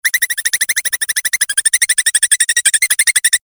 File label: cirping